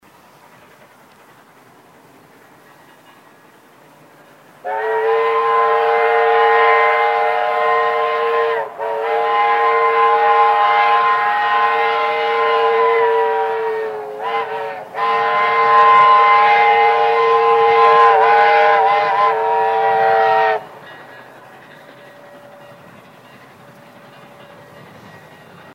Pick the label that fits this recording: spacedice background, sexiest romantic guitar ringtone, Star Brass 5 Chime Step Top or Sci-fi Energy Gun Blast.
Star Brass 5 Chime Step Top